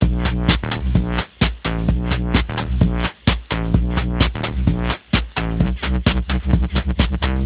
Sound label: flat beat